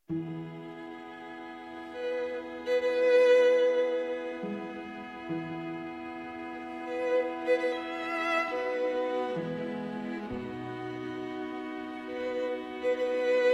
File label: String Quintet in C Major, Adagio